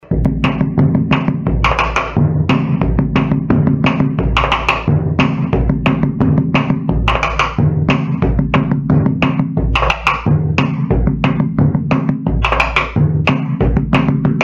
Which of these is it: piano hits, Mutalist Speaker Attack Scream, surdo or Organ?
surdo